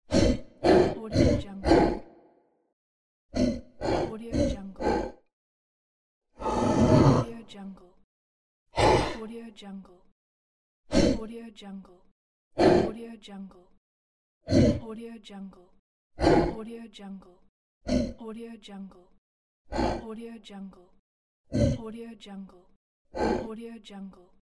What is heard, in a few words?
tiki tribal choir chant